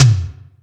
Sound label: TOM, M1-M3R; M1-M3R KITS; Greytsounds VOL-1